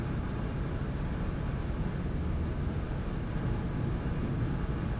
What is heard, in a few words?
office room tone; Counter Strike Model Pack; CS:GO